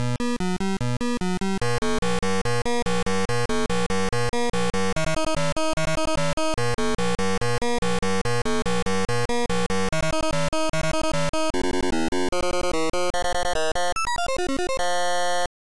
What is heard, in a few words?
Title Beeper; Bruce Lee